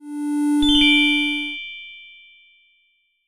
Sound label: level; tetrio